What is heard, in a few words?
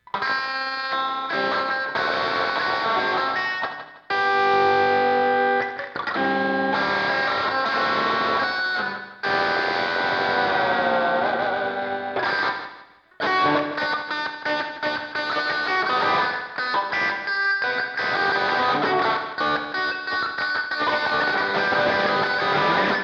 electric